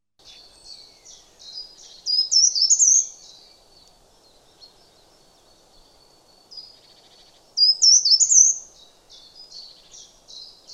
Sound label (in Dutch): Boomkruiper, vogelgeluiden